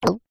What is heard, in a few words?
cartoon gulp swallow nervous